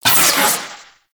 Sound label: Proj Tap Whoosh; Quests; The New War; Weapons; Omicrus